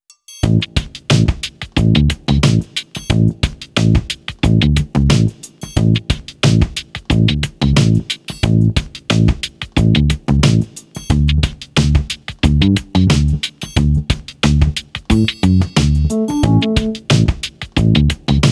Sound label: Funk